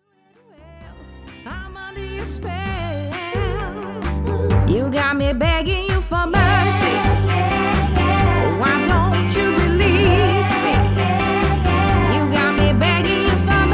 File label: BASSLINE DANCE